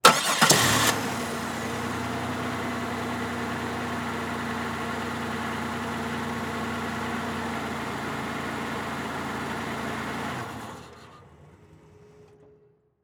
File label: Transportation Car Monte Carlo Start Idle Off Mic Under Engine Short